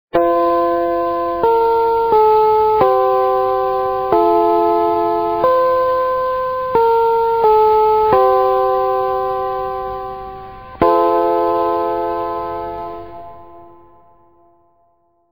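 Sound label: Radio Finland Interval Signal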